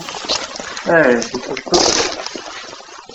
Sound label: Unknown noise; Erebus